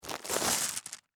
Bag Marbles Up, Foley, Plastic, Pick; Miscellaneous Toy; Blast Wave

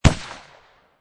Pistol Rhuger 357 Single Shot Designed; Gun / Pistol: Rhuger 357, Single shot, Designed.; Pistols

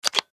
Switch Slide; Web Interactive; Technology; Multimedia; Slides; Objects

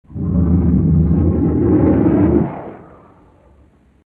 Dinosaur Deep Growl; Dinosaurs; Animals

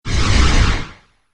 Modulation Whoosh; Whoosh Sound Effects

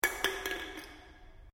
Can Aluminium Inside Drop; various metal cans; Drops; Objects

